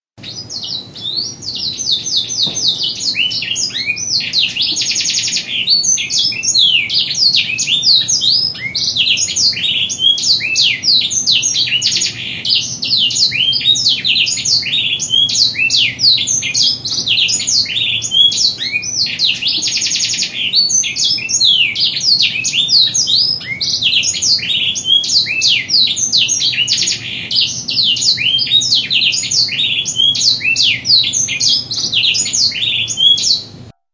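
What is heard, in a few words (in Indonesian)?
suara kicauan kenari isian full, canary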